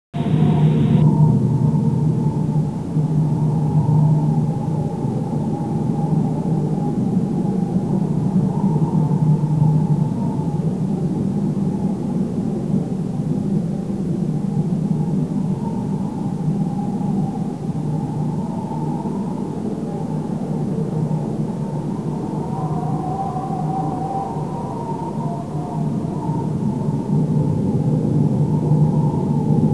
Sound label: cave wind